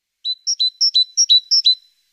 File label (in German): vogel